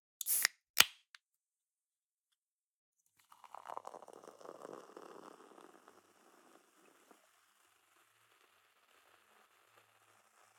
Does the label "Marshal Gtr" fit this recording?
No